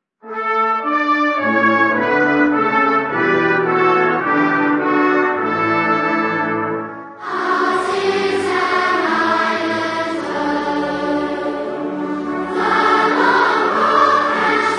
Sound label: Anthem String Choir; Jersey